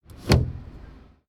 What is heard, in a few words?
Swoosh Slam